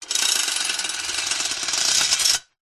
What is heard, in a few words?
industrial chain on metal movement